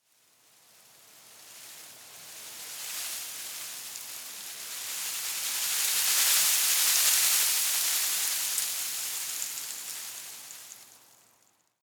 shrub tiny leaves rustle heavy; haus-garten

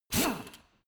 impactor screw out short light; maschinen; bohrer

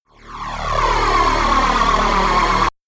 shot uprising dark shine, games; pulsieren

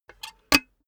cash box metal lid close hard; maschinen; soundpool

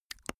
teeth clack smack; soundpool

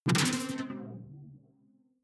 sci-fi alien bounce detuned bright; swooshes-swishes

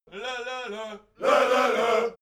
army song segment; militaer

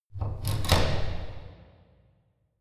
church door key

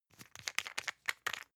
toothpaste close rattle hard; haus-garten; badezimmer

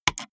gaming mouse left click single fast hard; maschinen; buero

